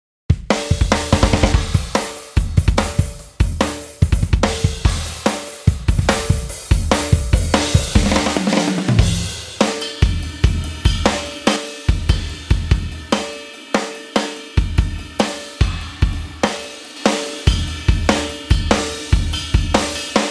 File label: Drums More punch